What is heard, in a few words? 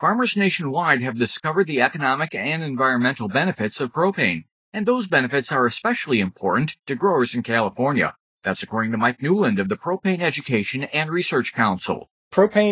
perc